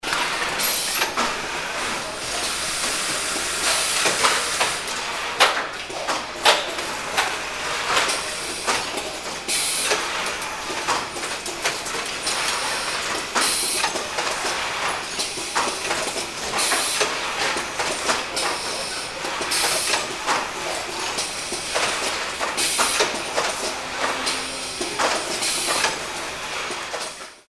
robot for assembly

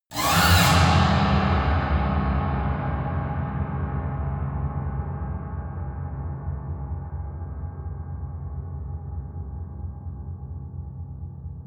piano twang fast squeal long

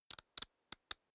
small book riffle through erratic

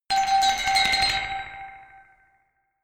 glockenspiel dark tremolo high short

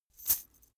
chain mail march single left

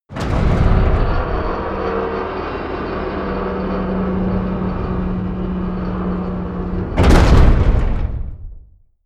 gate metal large close

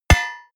hit metal hammer medium

